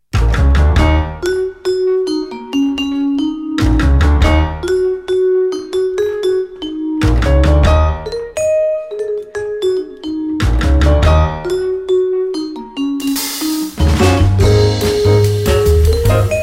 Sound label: Some Blues, straight-ahead jazz